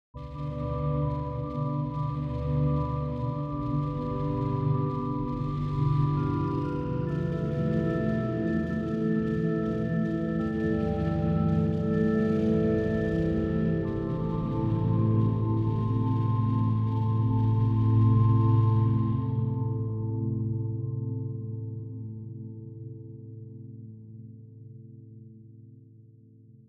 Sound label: Somber Music Theme